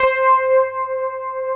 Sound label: FM ELP C4 R, Keyboards Old School; USB Soundscan vol.09 - Keyboards Old School; AKAI